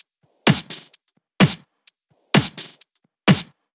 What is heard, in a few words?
Drumloops 128BPM